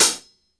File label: hit, hi-hats; Sampleheads; New York City Drumworks VOL-1